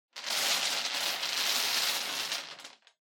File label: Bingo Machine Spin